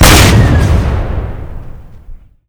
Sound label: bazooka shoot